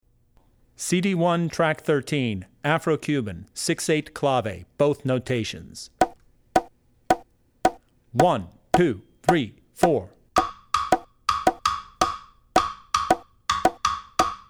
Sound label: 6/8 Clave (Both Notations); Drummer's Bible Tracks